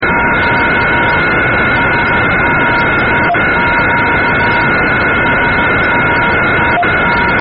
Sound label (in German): SAQ Audion Flederm SIMULATION